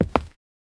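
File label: footsteps walking on solid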